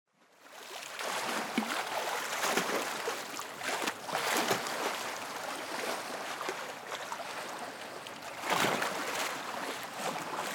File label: waves